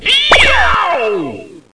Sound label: eeyow